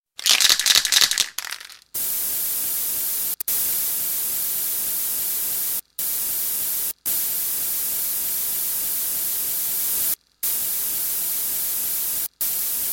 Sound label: Paint Spray Can